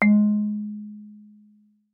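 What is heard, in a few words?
Marimba rubber fortissimo Ab4; MIS Pitches - 2014; Percussion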